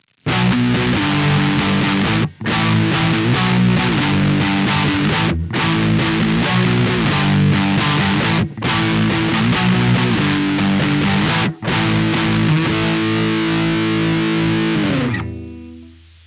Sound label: lead midle; vintage